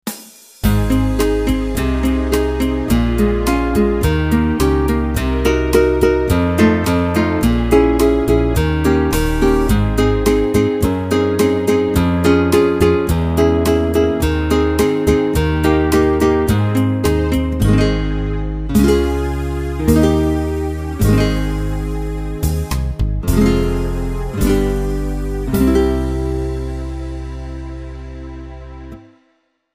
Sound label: guitar